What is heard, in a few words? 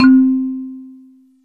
Wood Sequence